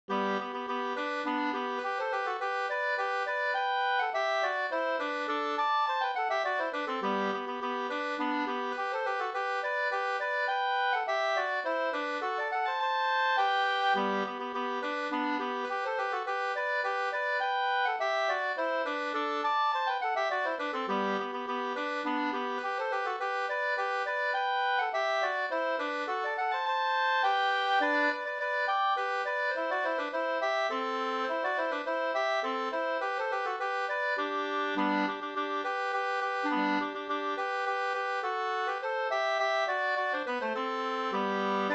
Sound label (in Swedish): slängpolska